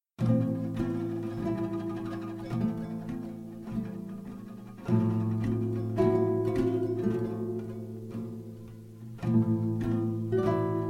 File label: loop